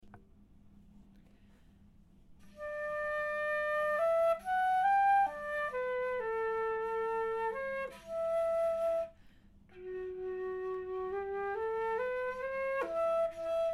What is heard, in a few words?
flute